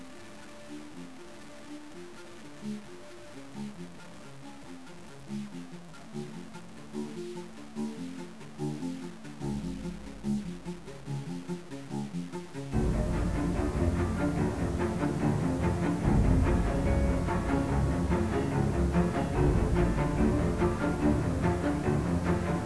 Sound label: boss music